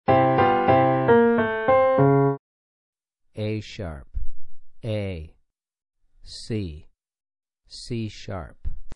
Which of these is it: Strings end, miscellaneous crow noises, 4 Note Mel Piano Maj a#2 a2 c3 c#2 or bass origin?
4 Note Mel Piano Maj a#2 a2 c3 c#2